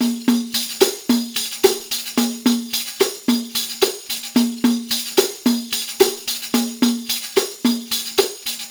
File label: PERLP, ArabianTraditions